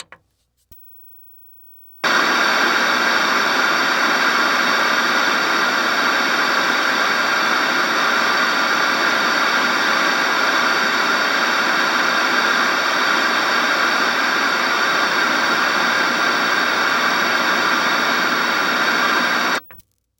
Technology Electronic TV Turn On Static Turn Off Long